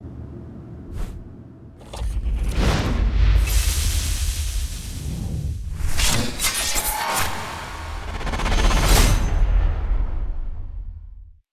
Space Cutting; Marie

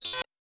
Drone; rabbids multiverse; iOS, macOS, Mobile

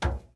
Footstep Metal Hollow

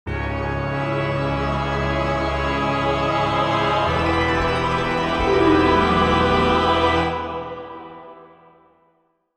JINGLE ENEMY BATTLE LANCE UP

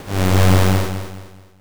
Barrage; Touhou Jitsuzaisou Dream Logical World

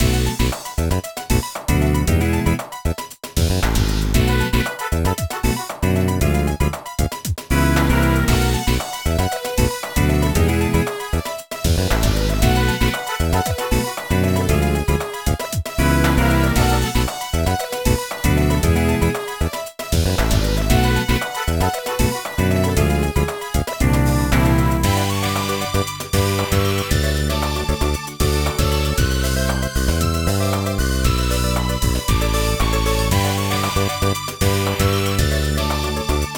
Gameover; Draglade; Nintendo DS

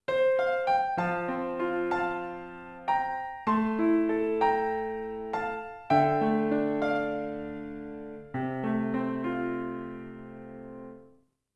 ending piano; Kono Aozora ni Yakusoku wo; Melody of the Sun and Sea; PS2

